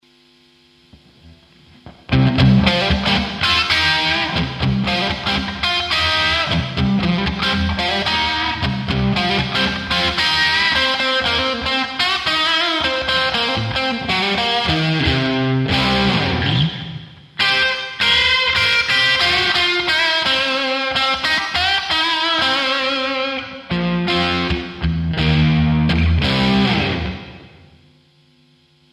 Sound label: dist, strat vintage